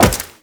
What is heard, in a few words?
Wall Jump Metal; Footsteps; WallRun; Warframe; Characters; Tenno